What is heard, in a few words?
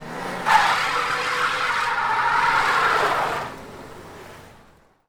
Lincoln Skid, Car Skids